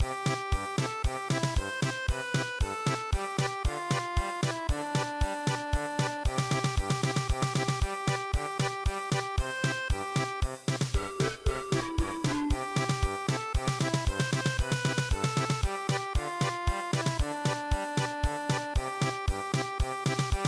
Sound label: village festival German Polka